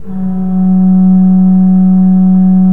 F2, Cathedral Organ; ILIO; Propeller Islands

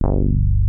FAT BASS, BASS STATION; Syntec; Wall of Sounds

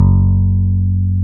F#2, E-Basses, Roland

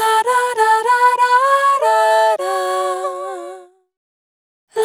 Dry, Multi-Voice Loops, Vocal Samples; Luminara; Sonic Foundry (Sony Creative Software) - Pocket Diva World Class Vocal Samples

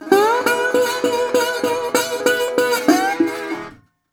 Blues Guitar Samples; ILIO - Fretworks

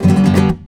RASGAND G# MJ, FLAMENCO; FLAMENCO FX; Guitars; Spectrasonics; Hans Zimmer Guitars VOL-2

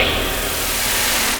NOISE, Production Effects

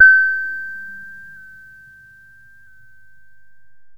D#1, A BASS; CMP - Analogon

